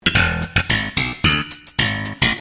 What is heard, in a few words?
sitcom transition bass riff; seinfeld